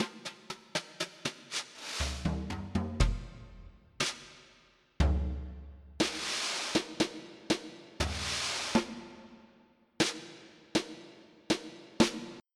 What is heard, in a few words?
Jazz Comp, jazz kit